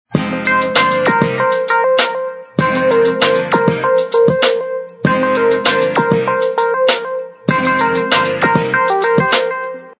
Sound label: Digital Signal, Motorola V220 phonetones